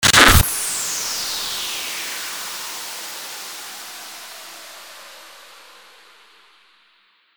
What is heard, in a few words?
STARTER WHOOSH